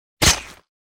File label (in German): Schlag; Menschen